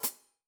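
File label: Sab Pedal Hat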